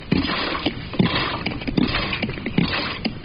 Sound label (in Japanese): ポンプ, 生活音; 生活音系効果音